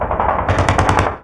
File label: city battle, streetwar